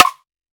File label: steel snare drum c key